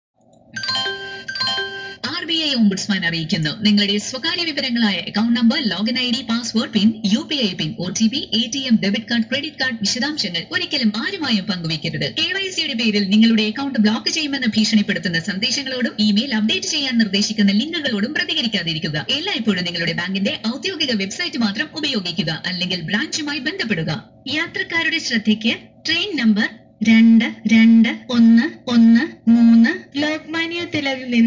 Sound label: jingle; RBI